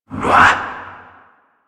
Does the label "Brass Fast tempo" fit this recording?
No